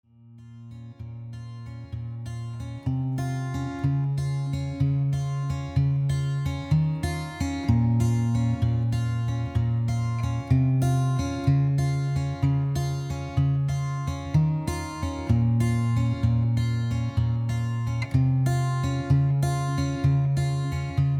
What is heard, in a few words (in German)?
pauken